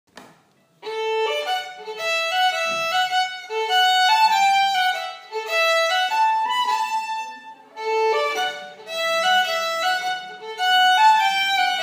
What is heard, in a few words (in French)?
Mazurka, violons; Qui Monte